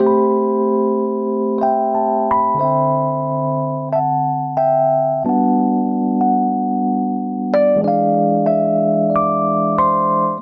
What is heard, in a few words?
Progression Melody & Chords ii - V - I - VI7 G Major 92 bpm, Electric Keyboards; Ableton; Factory Packs